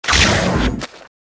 Lizard Dodge

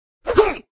elf attack voice up

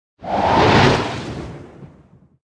snow man buff

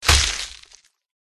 rhino attack contact bottom

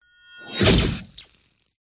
cat attack voice bottom

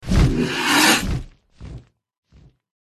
dragonkin attack voice up

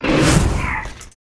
Rat dodge

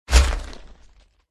dragon red attack contact up

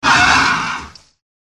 mini dragon wound

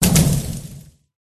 witch attack contact mid

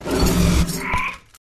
Rat block

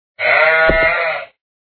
sheep attack voice

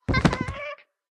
Pet Monkey Die

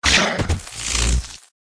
Pet Lizard Die; varan

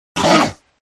werewolf attack voice up

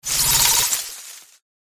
flower

